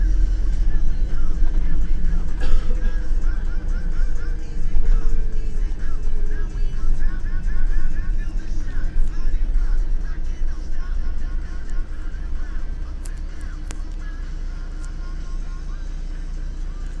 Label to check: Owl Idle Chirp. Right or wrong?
Wrong